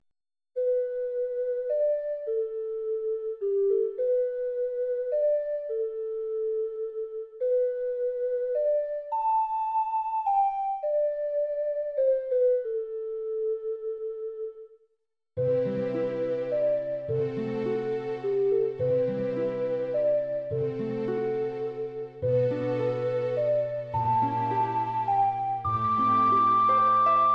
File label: Ocarina; Zelda's Ocarina; Legend of Zelda, The : Ocarina of Time